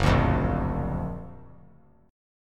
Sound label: Gb Augmented Major 7th Gb down 3C,3F,3A,3D; Gb+M7 strummed